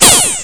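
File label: FIRE MISSILE; WINROB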